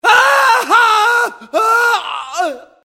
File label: infected; csgo